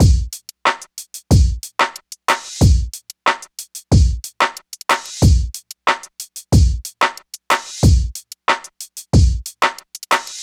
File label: Hip Hop Beat, Drums